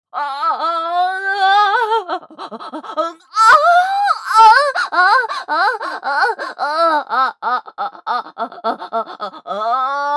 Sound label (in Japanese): 騎乗位オホ・さらに激しい; loop音声